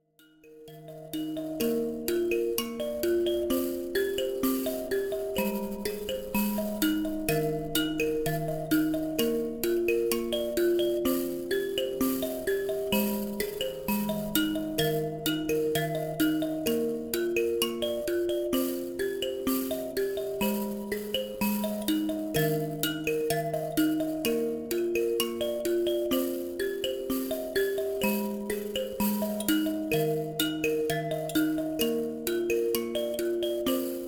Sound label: mbira